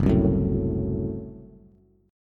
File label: Gb Diminished, down, Bass; strummed; JGuitar